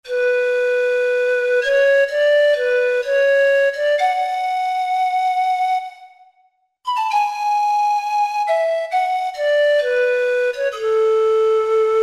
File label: Zampona, Roland SR-JV80-05 World